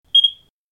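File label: Alarm Electronic Beep; hi-tech-various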